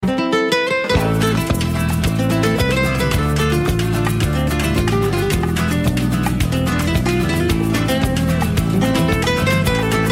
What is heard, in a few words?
spanish samba, ringtone